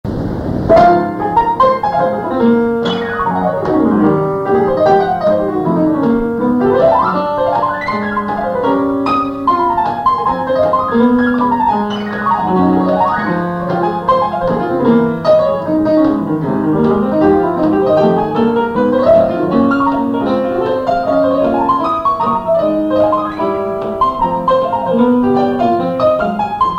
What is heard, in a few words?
(instrumental)